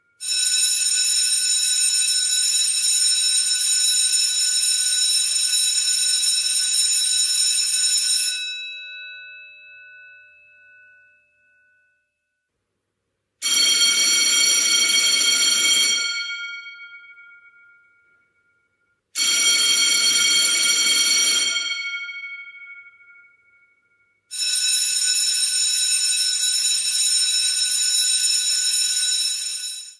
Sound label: ringtone